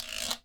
GUIRO

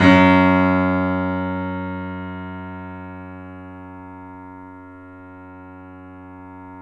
The Piano F#; InVision Interactive - The Piano

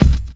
Acoustic, bass drum; Drum Hits

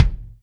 Kick, Alesis DM5

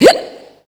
FEMALE, Dance Vocals

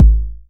909 BD; Goldbaby Tape808 & Tape909; The Tape 909

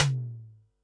tom, Pearl SC-40 Toms

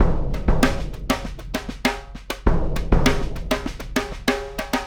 Big Drum and Snare; Sonic Foundry (Sony Creative Software); Essential Percussion; Drums; Big Drum Combos

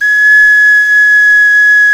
CLAYFLUTE, Heart of Africa; Spectrasonics; Heart of Africa 1

